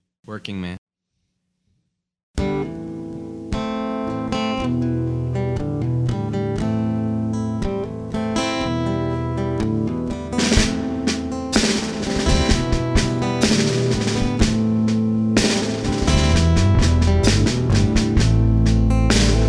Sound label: Working Man Acoustic